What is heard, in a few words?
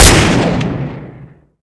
357 shot; xash3d fwgs; urbicide